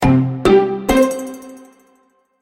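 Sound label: Notification; Kalimat Karash; Android, iOS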